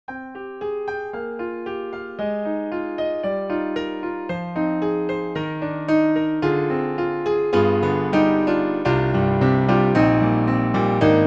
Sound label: Bright Natural Piano